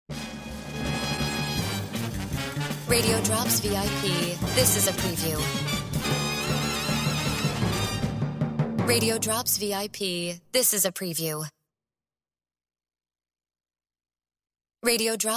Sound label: Music Bed